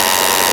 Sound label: OSCILL